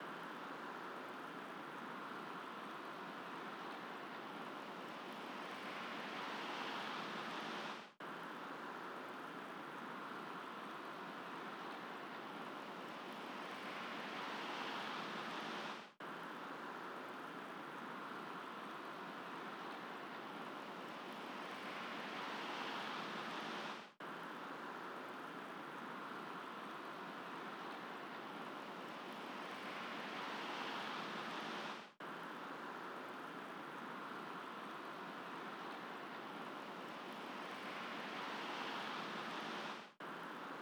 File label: 120 BPM F Min Atmosphere; Cymatics; Destiny Gold Expansion; Blue Magic